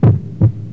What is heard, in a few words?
ATMOS